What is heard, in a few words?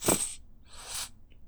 Plop Airy, Bottle Plops